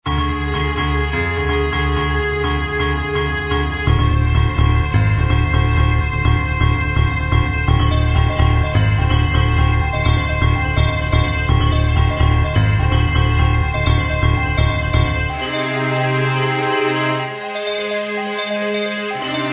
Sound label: Deep Piano Suspense